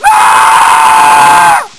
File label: man scream